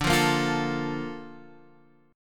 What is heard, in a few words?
A sharp Major 7th